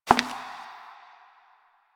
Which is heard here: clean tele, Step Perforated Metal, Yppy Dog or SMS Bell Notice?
Step Perforated Metal